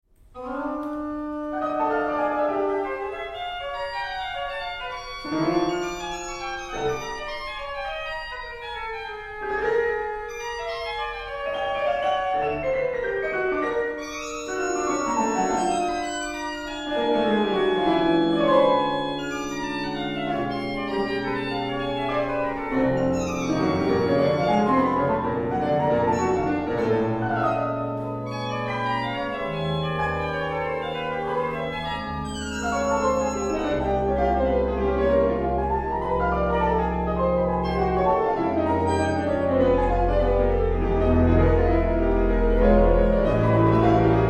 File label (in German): Sonate; Laukvik Sonate 2